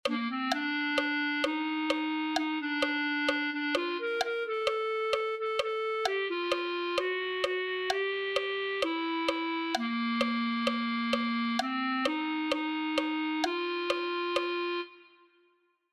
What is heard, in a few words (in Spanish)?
clarinete